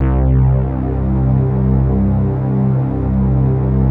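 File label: PAD, atmospheric pads; Best Service; XX Large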